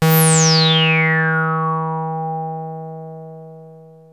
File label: 303 D#3, TB 303